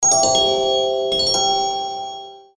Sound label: info bell